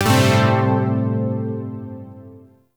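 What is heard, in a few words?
Shawzin Major Chord; Zariman Shawzin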